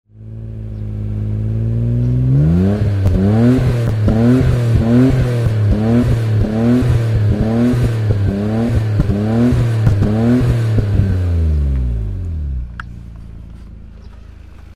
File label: Blip, R32; BNR32; Ex Sound